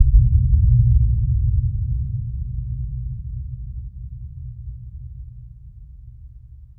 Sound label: Steel Sheet Thud one shot; Sonic Foundry (Sony Creative Software) - Ambient Atmospheres & Rhythms; Ambient-Metals